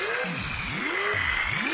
Jauge Loop Short; Puddle Plus

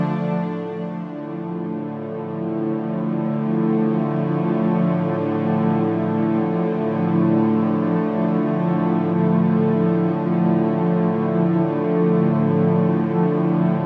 Violon Puzzle Lo; ps vita; Didot

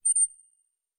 Creak Lit

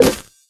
Caisse Eclat; Puddle

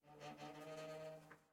Creak Lit

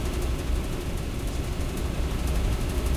Ventil Souffle

